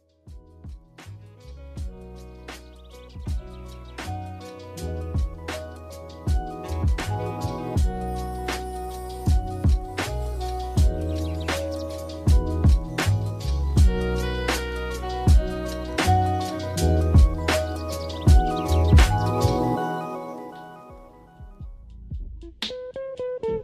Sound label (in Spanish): musica fondo